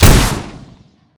punish rocket; garrysmod

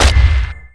refinery start; garrysmod

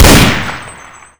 stg44; garrysmod

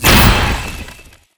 ghost gun; garrysmod

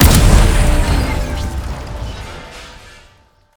medbay death; garrysmod

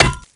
mine; garrysmod

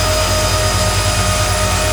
beam loop; garrysmod

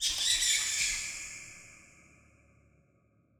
sewer bat; garrysmod

